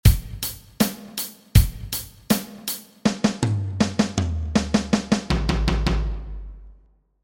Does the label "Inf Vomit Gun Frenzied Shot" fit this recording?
No